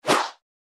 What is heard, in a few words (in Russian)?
Мгновенный переход